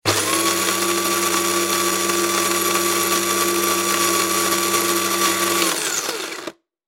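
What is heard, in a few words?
sounds of coffee beans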